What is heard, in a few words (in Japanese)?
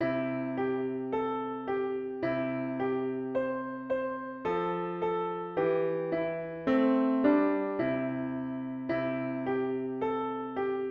ピアノ